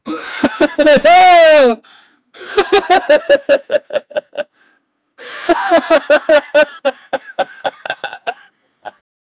a french man laughing; oh no

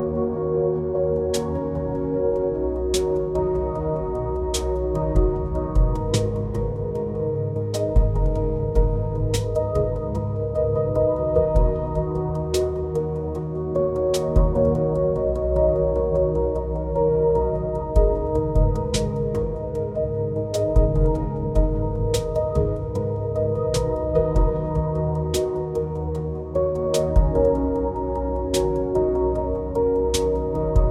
relaxing ambient lofi pia

